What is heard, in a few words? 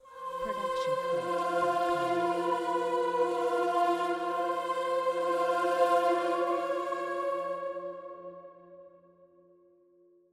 halloween spooky choir; soundscrate